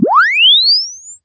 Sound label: Press Two Sides Chirp; Samsung 4 Recordings